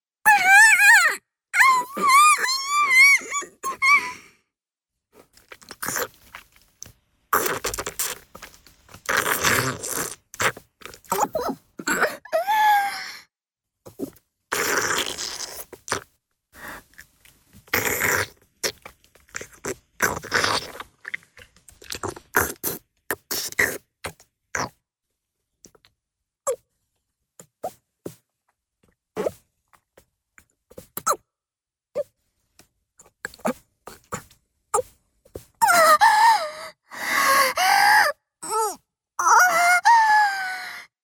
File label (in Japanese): 口内射精→飲精, チュパ音; チュパ音抜粋集